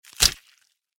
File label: scorpion sting; minecraft; technic